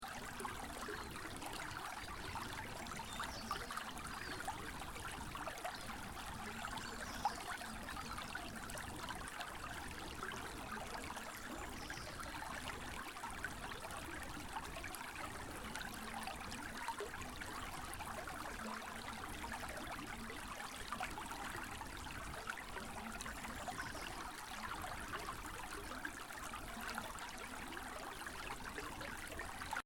Quaint Stream Loop, Seamlessly looping small river; Pleasant sound of a small stream. Medium length version; River Sound Effects